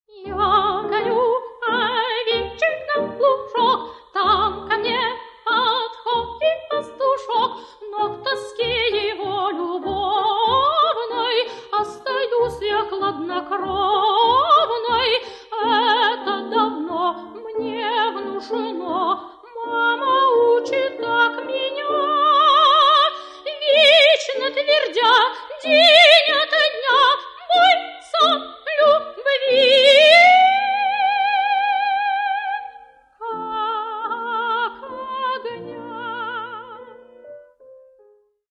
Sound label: vocal miniatures